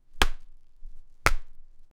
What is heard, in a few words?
human hand throwing dice, outdoors